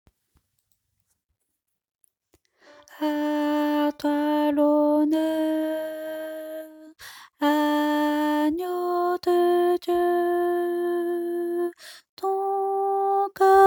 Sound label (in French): alto